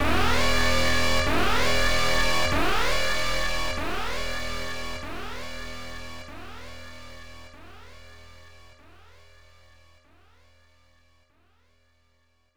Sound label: Boss Warning